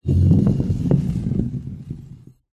bottle large glass empty roll wooden board; foley

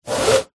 rope pull rub against surface; foley

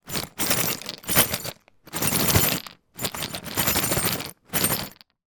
odds and ends in pot shake keys pins batteries

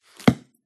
book close paper; PMSFX

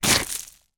foley footstep boot kick gravel stones out

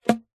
card long hollow tube grab or catch hand; foley

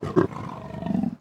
Leapord Growl; Blastwave FX

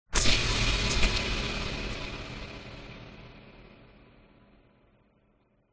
sound design cinematic hit loud bright scary

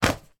newspaper folded drop on floor; sound effects one

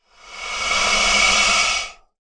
animals alligator hiss

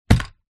household led lantern lamp place down on surface

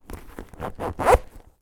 handbag pocket zipper; foley

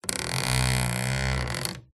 Stress Creak Squeak Groan; PMSFX

